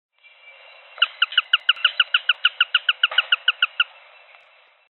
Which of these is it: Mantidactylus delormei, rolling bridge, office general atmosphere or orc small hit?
Mantidactylus delormei